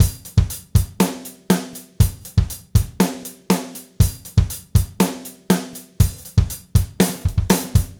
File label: Gretsch groove 90 BPM, 1967 Gretsch Kit drums